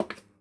ceramic step; Golden Crayon Sounds

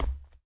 hardwood step; Golden Crayon Sounds

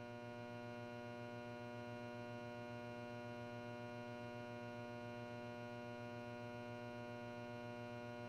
room tone; Golden Crayon Sounds

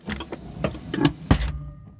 door close; Golden Crayon Sounds

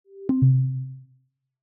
cancel, iOS Sounds

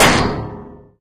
wall metal; Golden Crayon Sounds

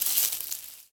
leaves step; Golden Crayon Sounds

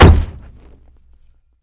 wall building; Golden Crayon Sounds

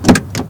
door open; Golden Crayon Sounds

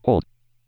Drill; ESAN VO Sound Schemes; ESan Eloquence VO Sounds Pack

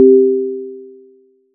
Msg Received; Skype Sounds

